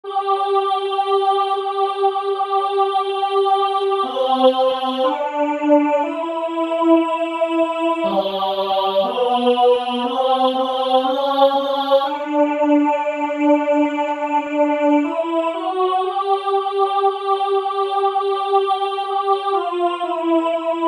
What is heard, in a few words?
everybody sing freedom voix